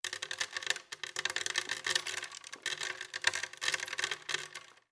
loose change on wood

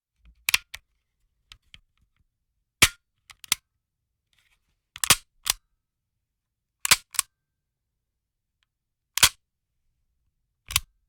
handgun trigger pulls

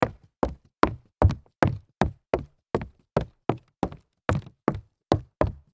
jog on hollow wood

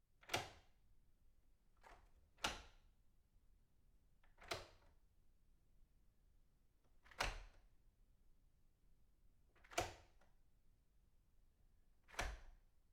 door locking shut

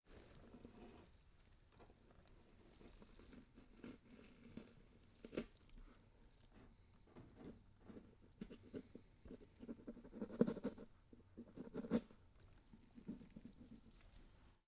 scraping skin cut

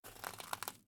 footstep on cracked glass

